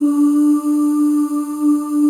D3 FEM OOS

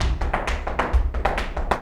Flamenco, ZAPATEADOSOL

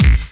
ASR-X Kick, Ensoniq

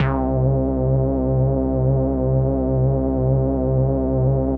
Pad C, Synth Prophet One Shots; Electronisounds

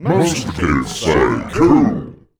VOICE FX, Dance Vocals

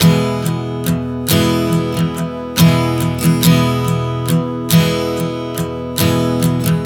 Strum 070 E, One Chord Strumming 070 bpm; Sonic Foundry (Sony Creative Software); Songwriter Acoustic Guitar Companion

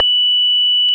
ORG, Farfisa; Roland LCDP09 Keys of the 60s and 70s 1; Farfisa Flts; Farflt DBars

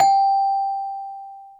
CELESTA G5; AKAI S-Series CD-ROM Sound Library VOL-2

